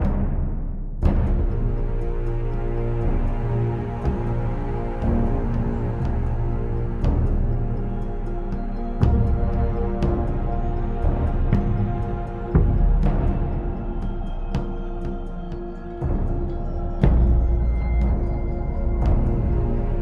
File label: Loop Tense